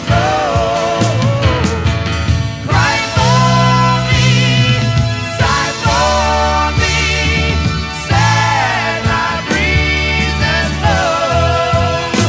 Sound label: Lonely Wind Piano Solo Live